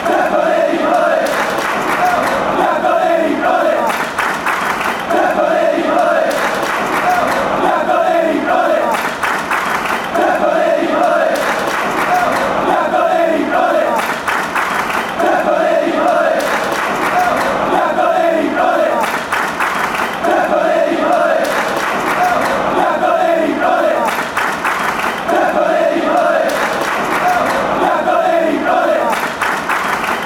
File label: ole, fanchants